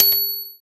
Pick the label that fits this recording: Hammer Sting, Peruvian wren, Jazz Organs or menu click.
menu click